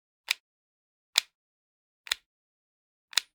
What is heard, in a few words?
Generic Button Plastic Tiny; boom library; The Interface